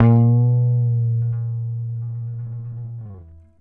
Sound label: A#1 GTR TRIK; Univers Sons; Jazzistic